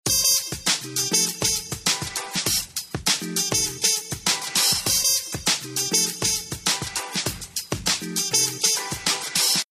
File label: Retro Beat; LG VS750 Fathum